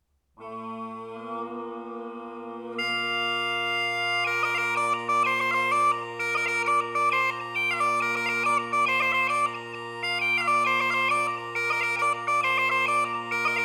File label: police pipers; glasgow